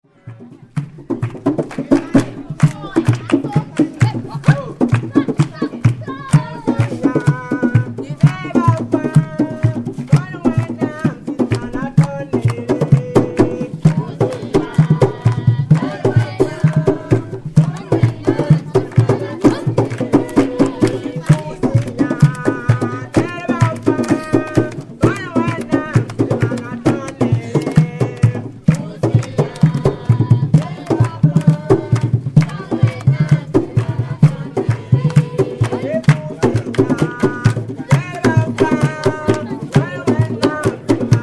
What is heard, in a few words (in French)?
chants et danses Boulsa; voyage a boulsa novembre 2010